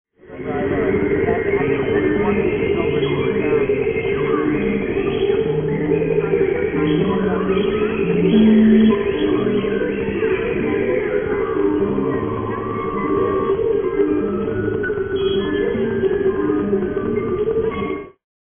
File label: orch